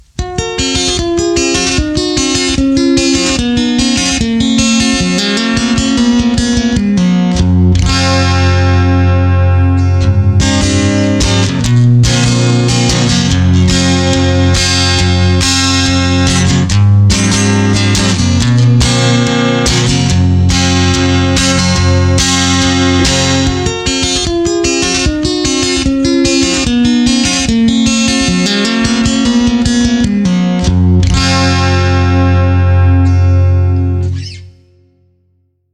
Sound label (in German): Piezo und Halspickup; Petrucci BFR